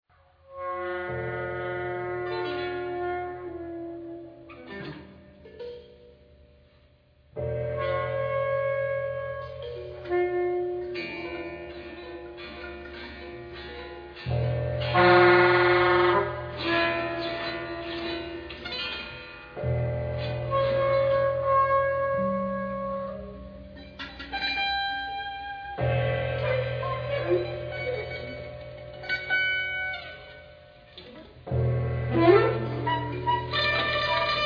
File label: Ambience